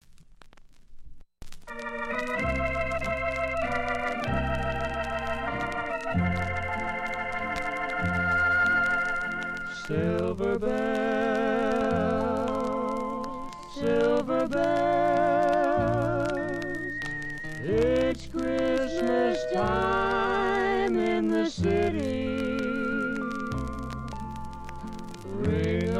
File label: Male Female Country duet